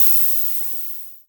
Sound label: Crash, Cymbals; Vengeance Samples